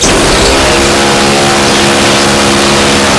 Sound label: cerberus fire crit; tf2classic